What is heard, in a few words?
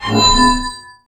OUCH HIT, METAL PERC; Zero-G Ambient 1